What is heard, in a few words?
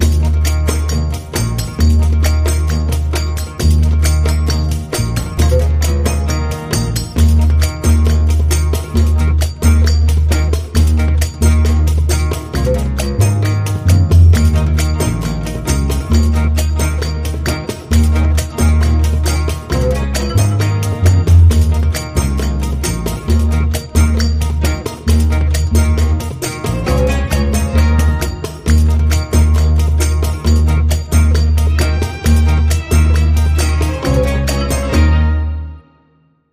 Short Main Groove